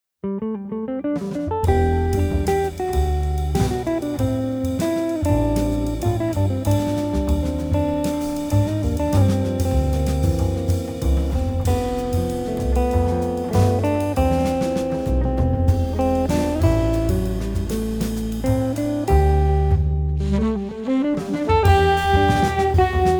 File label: Underwater